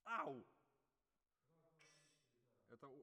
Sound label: Tenor, RNR for piano